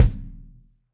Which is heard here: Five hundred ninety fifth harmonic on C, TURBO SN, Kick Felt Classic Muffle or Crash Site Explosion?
Kick Felt Classic Muffle